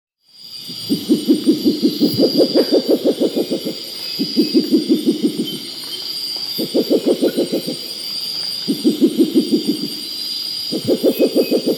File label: Spectacled Owl (Pulsatrix perspicillata), Ecuador; S. America